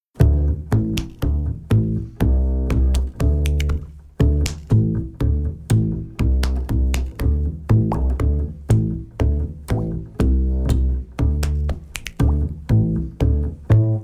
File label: Backingtrack